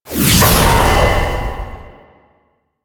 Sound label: Stalker Assassin Dash; Warframe; Warframes